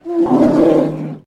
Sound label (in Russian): groznij rik starogo ranennogo medvedya